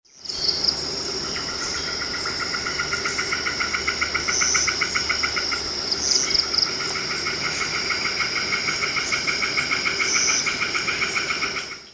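hylarana baramica, Golden-eared Rough-sided Frog call; Gunung Arong, Johor, Peninsular Mala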